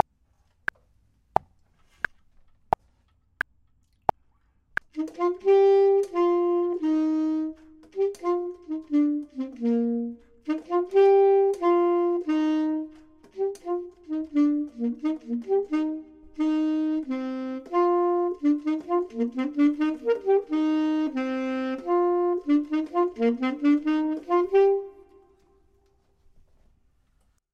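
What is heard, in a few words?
bottom, Saxophone and Clarinet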